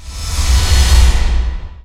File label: CYBER KIT, DRUMS